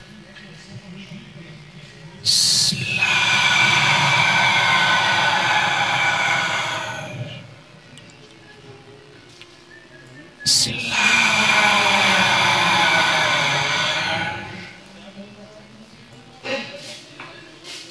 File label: JINGLE